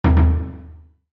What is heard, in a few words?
taiko